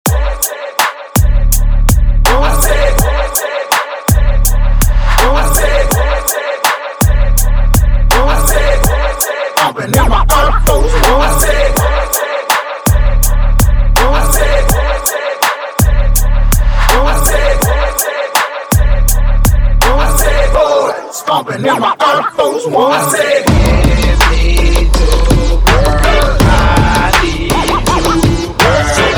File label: dub breaker 82 bpm